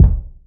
bassy kick sample d sharp key

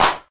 hand clap sound E key

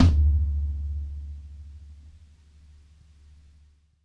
huge tom one shot d key

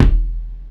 bassy kick drum sample E key; kick drum samples

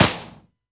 airy acoustic snare f key; snare drum samples

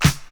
original acoustic snare g key

